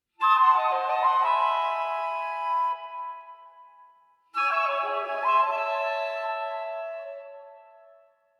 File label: Wind Fanfare